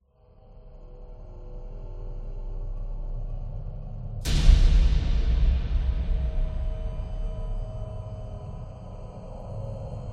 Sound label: Dark Ambient